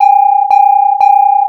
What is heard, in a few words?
warning; AutoZoom